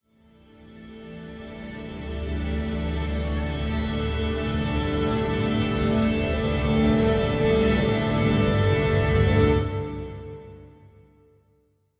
Music Start; There Is No Game: Wrong Dimension

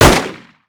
pistol fire

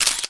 Shutter

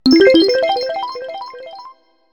Collect Seed; donkey kong 64

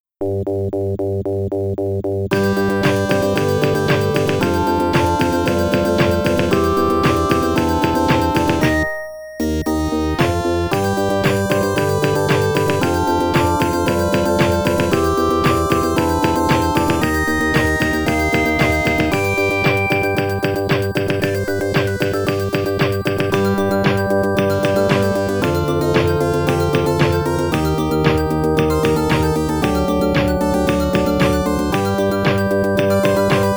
Start Interval

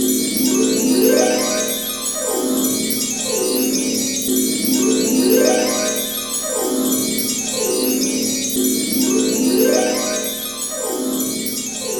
Heavenly Sounds; Worms Forts: Under Siege

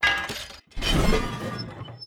hatch open

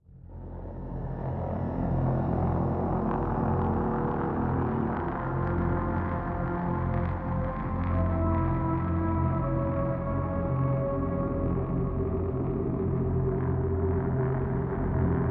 Music Safe Landing

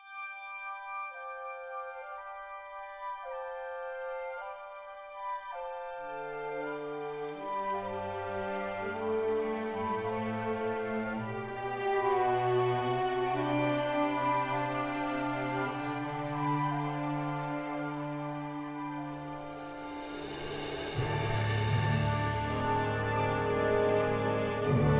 Pastoral Space Ambient

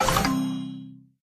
Chest In Jingle; Thomas & Friends Minis

